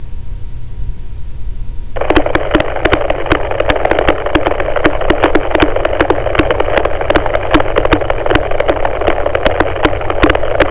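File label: 900 weak signal interference; AR902Mhz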